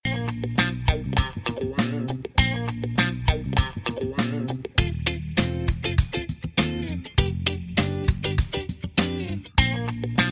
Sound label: Instrumental